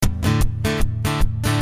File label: Strummed D 152